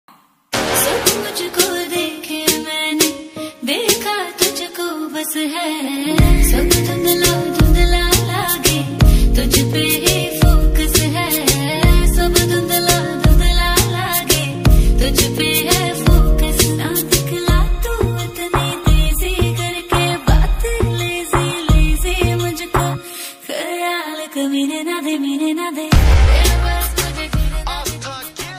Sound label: Female version rap ringtone